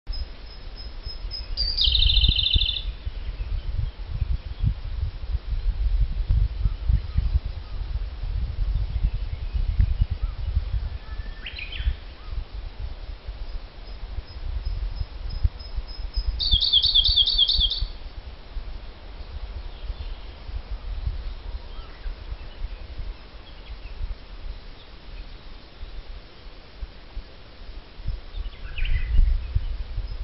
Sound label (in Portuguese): canto do pisco azul siberiano